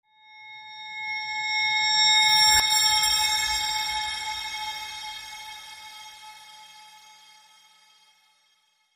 Faint Reversed Bell

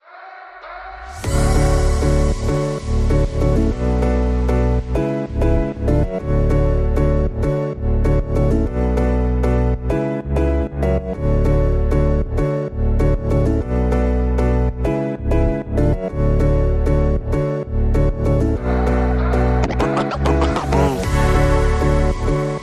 No Drums Reduced Arrangement

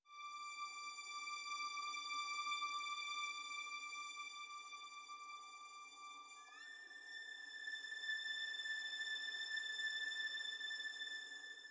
Strings Only

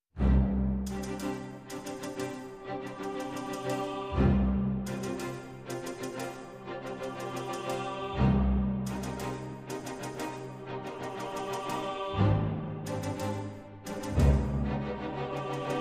Building Tension Orchestra Only

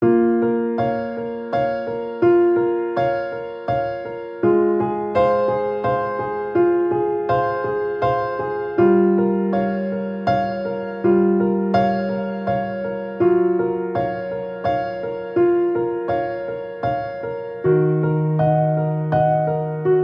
Piano Intimate; 30sec

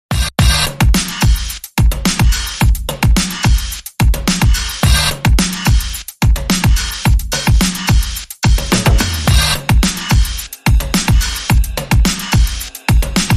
Beat

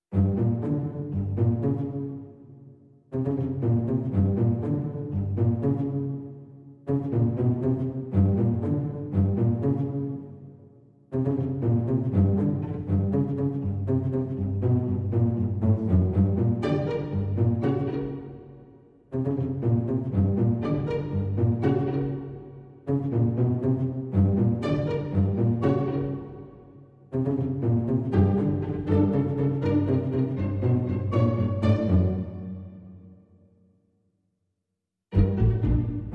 Strings Only

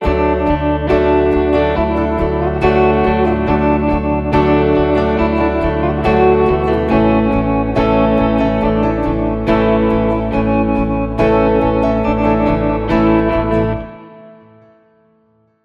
Calm Strolling, No Drums

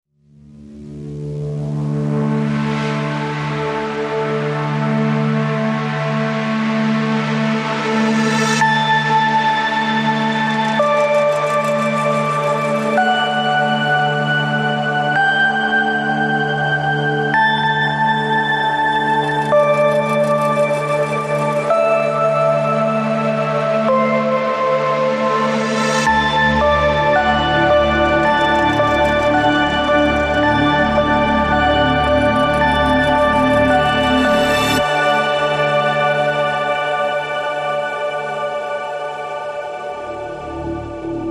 Ambient

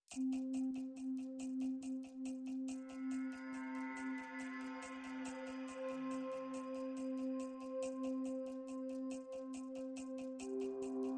Pads and Pulses

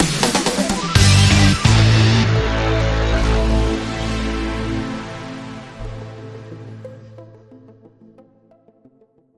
Power News Pad Sting

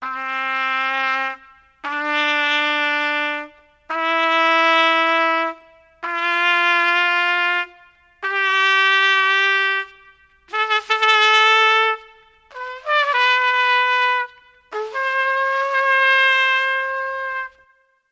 Trumpet Bad Practice Scale With Reverb

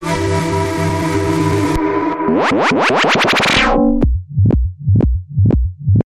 Transit Sci Fi Bumper

